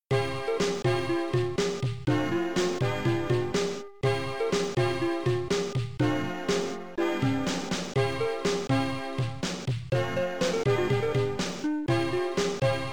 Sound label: London Bridge Is Falling Down, amiga; Lemmings